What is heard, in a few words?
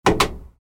telephone buttons